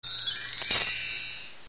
chimes

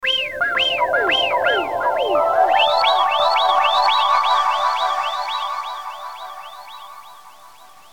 SMS Ringtones

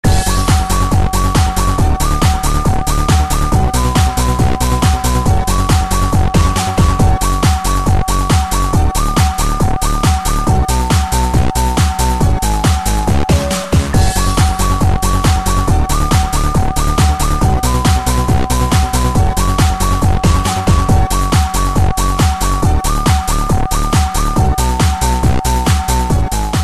samsung s10

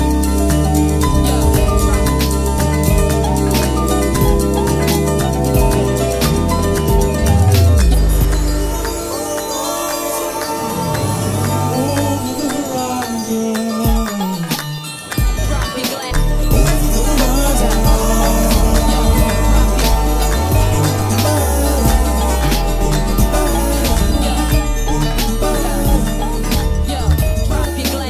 Ringtone; samsung; Samsung M11

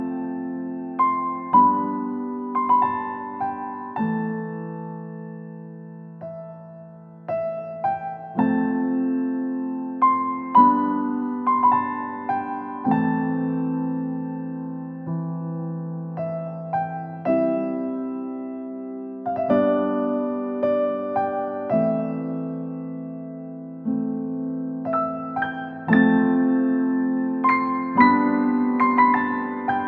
relax ringtone

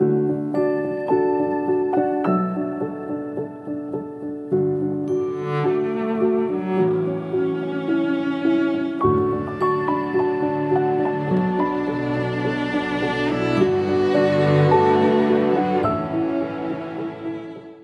samsung j7 ringtone

